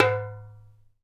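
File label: djembe, African Perc; Spectrasonics; Supreme Beats; African Contemporary; SB Afro DRMs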